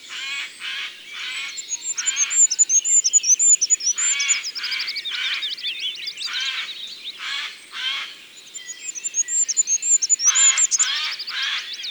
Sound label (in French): Geai des chenes; ABC de la nature